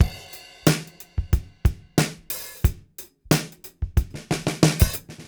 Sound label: Beat Loops; Zero-G; Monster Beats